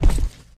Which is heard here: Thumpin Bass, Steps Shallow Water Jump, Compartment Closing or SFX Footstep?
SFX Footstep